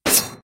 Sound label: spike